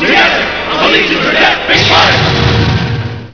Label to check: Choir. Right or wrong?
Wrong